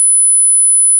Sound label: sine Wave 1000